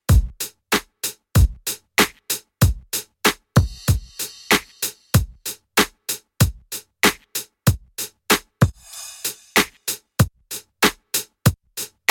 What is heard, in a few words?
Duck Comp